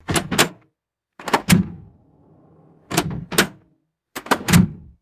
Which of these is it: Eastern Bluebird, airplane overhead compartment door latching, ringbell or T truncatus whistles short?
airplane overhead compartment door latching